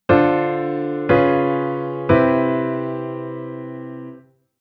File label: Chord Sub V7 To Five